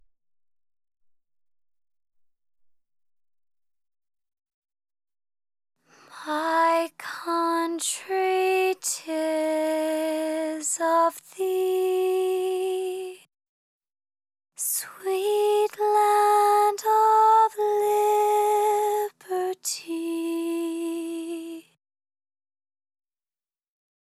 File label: vocal only version; immediate music; my country tis of thee